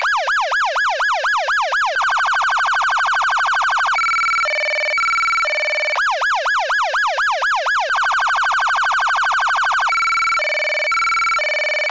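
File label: Federal Signal Touchmaster Delta Manual, siren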